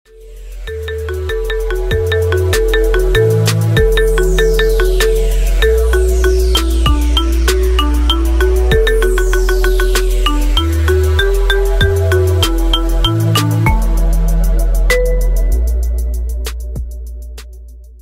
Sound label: Marimba Tone